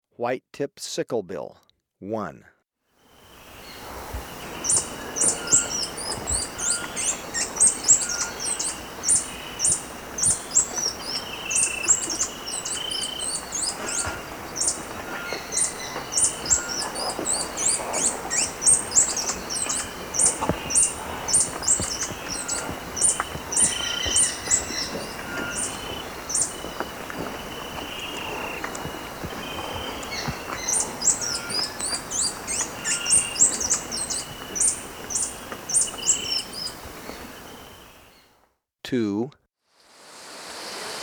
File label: White-tipped Sicklebill; S. America